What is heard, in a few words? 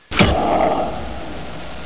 exit pop; mst3k